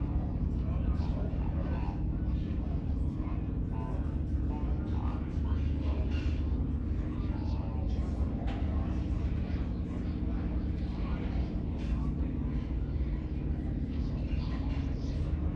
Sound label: Inside, ambience; Lego Star Wars (PC); Dexster's Diner